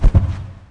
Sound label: Barrel; Urban Chaos